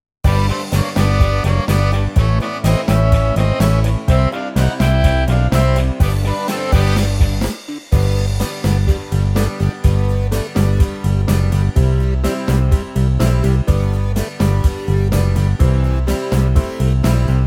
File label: Karaoke